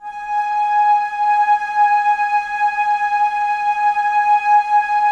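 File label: flute, Woodwinds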